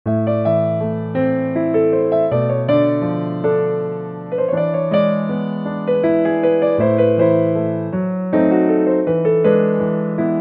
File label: Piano